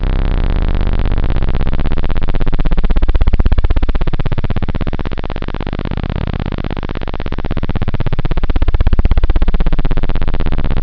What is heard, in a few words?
pwm pong